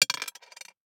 DICE on Glass, Throw and Roll, Standard, 1 One Die; Samples; ArticulatedSounds; DICE Sfx Party (Extended)